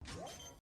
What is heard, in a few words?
Spike Defuse; Valorant; Soundpad